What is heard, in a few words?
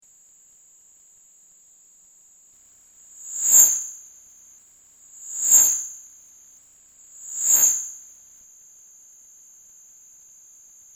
laser